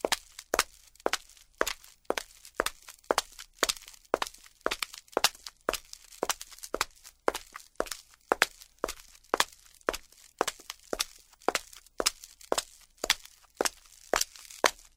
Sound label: walk